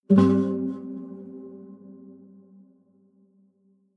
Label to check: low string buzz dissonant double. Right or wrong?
Right